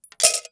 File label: SFX COINS; Gothos